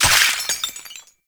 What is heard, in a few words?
Loot Crate Burst; Ambience; Gameplay; Entrati; Warframe; Infested Eris